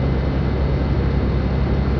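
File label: Engine idle; hover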